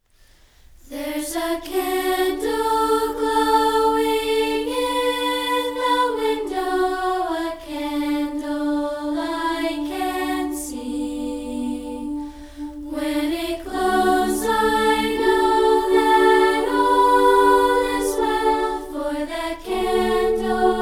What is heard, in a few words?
Candle Glowing In The Window A Cappella